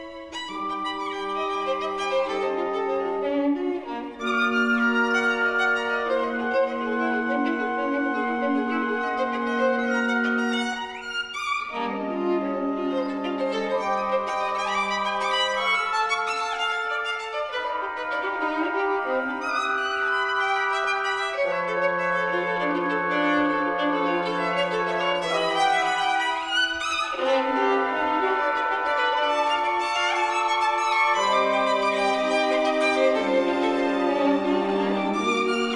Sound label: concerto for violin and orchestra, IV. fast